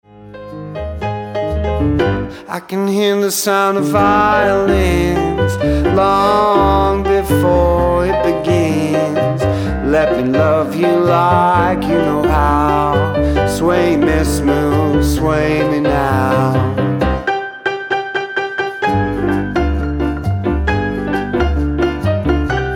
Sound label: jazz kings